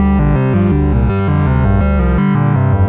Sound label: Arp Tambura, Guitartronics; Sonic Foundry (Sony Creative Software); Ma Ja Le Ethereal Textures